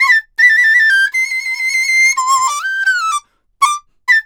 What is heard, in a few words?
DIZU, 90; INIS - Opium